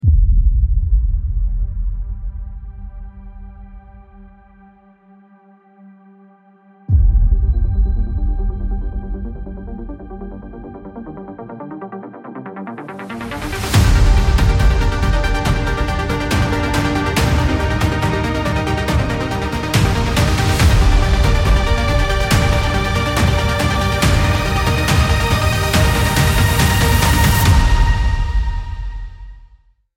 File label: cinematic pulse